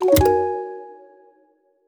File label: success